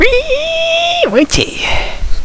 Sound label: WEEEEE